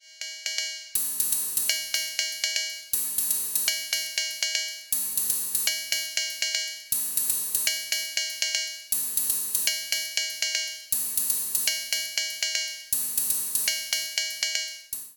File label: Elektron Analog Rytm Metallic CB CY